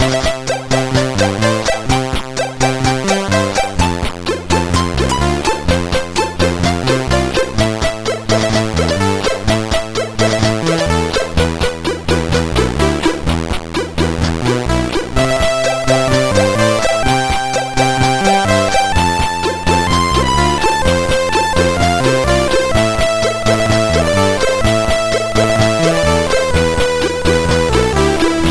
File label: Music Samples, sid, CSG8580R5